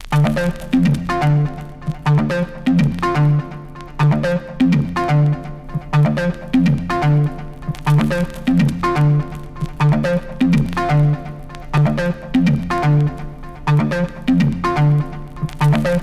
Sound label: synth